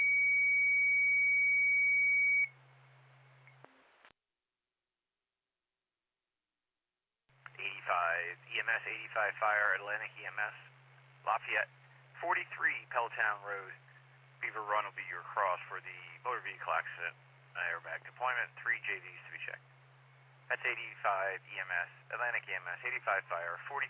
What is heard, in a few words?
Siren; Lafayette FD